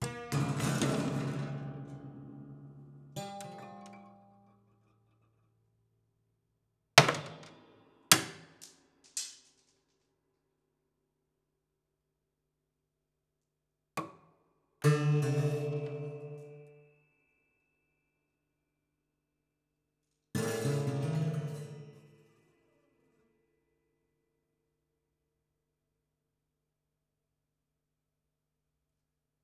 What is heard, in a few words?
Marble Lazy Bounce, Piano; Piano Destruction